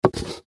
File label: Gift Box Pick Up; SoundEffects-Blastwave